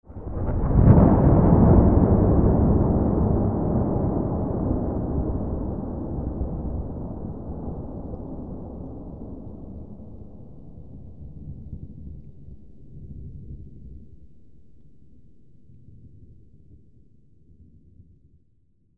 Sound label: thunder huge